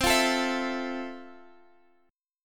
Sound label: F Major 7th, C